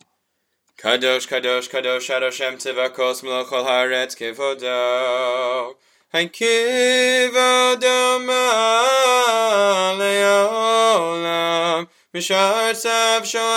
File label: Kadosh Upbeat Melody; Musaf; Yom Zeh Mchubad